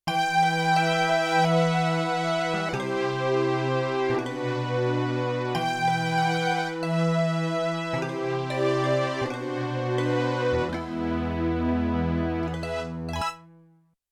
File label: Strings & Pluk; Combo Performance 2